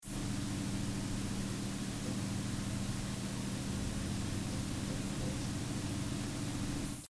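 Royer dBooster2 Roomtone minus20dB Sennheiser MD421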